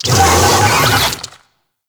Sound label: Infested Big Ashen Vocal Attack; Warframe